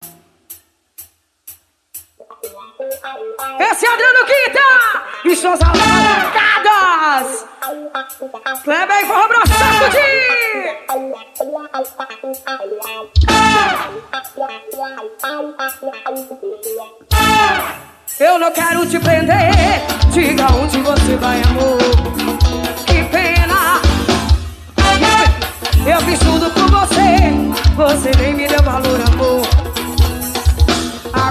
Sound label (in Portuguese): forro ao vivo; morafolia 2013